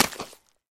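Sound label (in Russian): короткий звучный громкий звук удара по мячу бейсбольной битой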